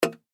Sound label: Drip Metal Surface, Water Drip Metal Surface Single; Dripping; Blastwave